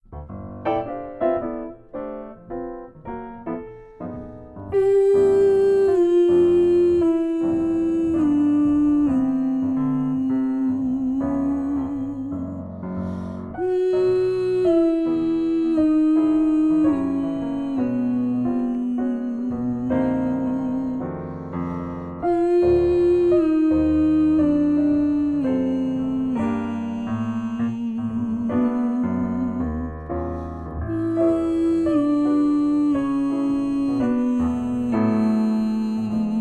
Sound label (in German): Warm up tief und hoch; 01-Warm-up mmmmm; Einsingen hohe Stimmen; Stimmbildung